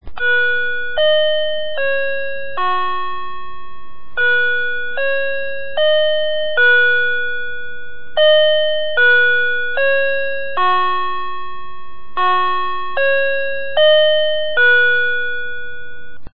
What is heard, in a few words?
chime, quartz clock